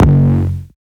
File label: 808, F